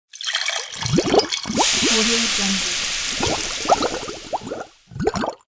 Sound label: potion mixing chemical reaction